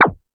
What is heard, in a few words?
Hip House, Drum Machines E-MU Pro-Cussion